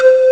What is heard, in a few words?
desert flute; Amiga Soundtracker Sample Packs